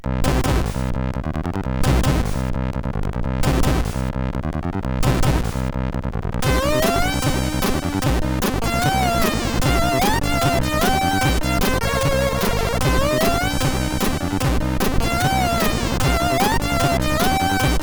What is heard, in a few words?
Intro, sid CSG8580R5; Mr Mouse